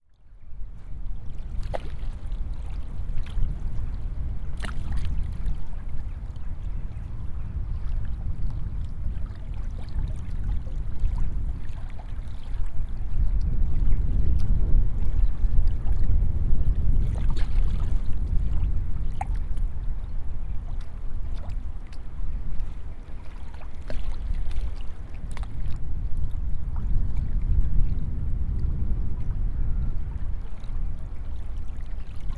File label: lesnoye lake; sergiyevposad